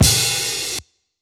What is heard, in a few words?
Open hit Hat